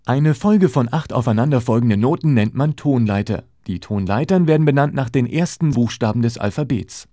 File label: SCALES